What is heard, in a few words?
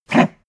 pug gag short; natur-tiere; hunde